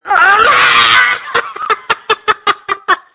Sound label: Scream